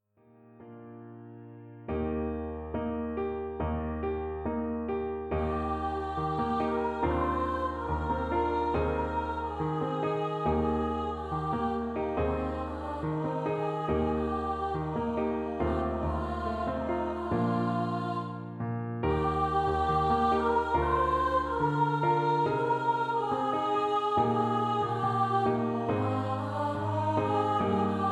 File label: 2 part song for treble voices